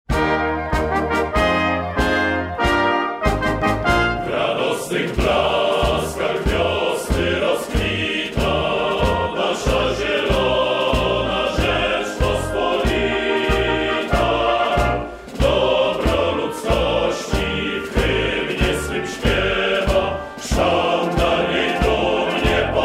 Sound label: hymn